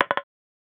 isolated plinko ball drop

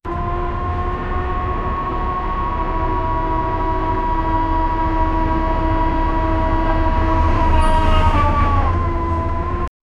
bus driving very fast

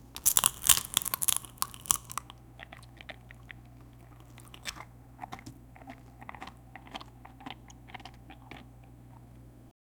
chocolate eating

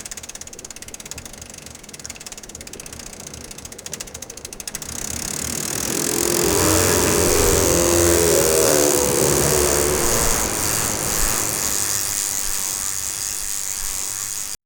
bike kick start and run

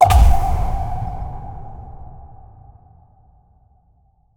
jump sound realistic, human action

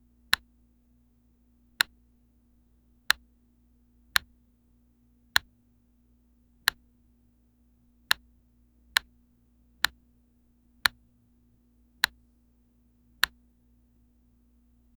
a short crisp and realist, computers

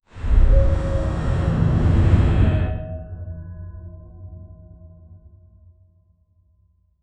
small underground room a, metallic

